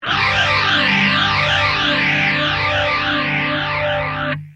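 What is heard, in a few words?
guitar rph10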